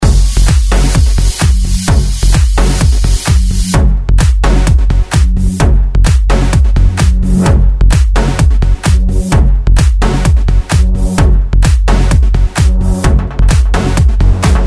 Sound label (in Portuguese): trilhas dance